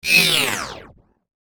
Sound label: Sharp Laser Motor Whoosh; swish, sweep and swoop; Andrew Fleming